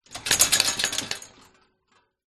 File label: household mirror floor standing shake wobble